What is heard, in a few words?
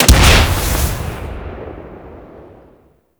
Grenade Launcher Explosion; Warframe; Grineer